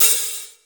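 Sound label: hi hat open, drums; East West - Global Sounds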